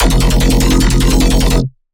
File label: Melodic Bass F 150, One-Shots Bass Hits; Ghosthack; Color Bass Essentials